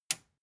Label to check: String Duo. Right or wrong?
Wrong